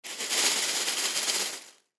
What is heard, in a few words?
Pasta Noodles Shake Pan; Food; Foley; Household; Blastwave